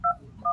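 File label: notification sound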